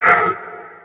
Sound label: con pain, controller; svencoop